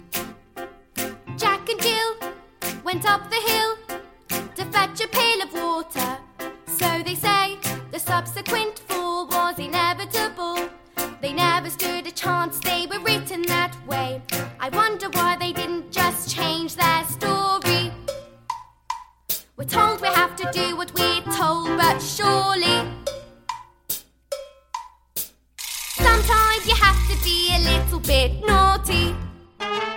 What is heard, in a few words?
naughty vocals